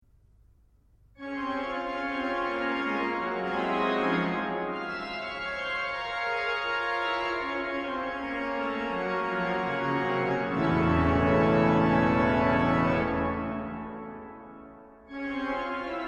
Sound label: Toccata Dess dur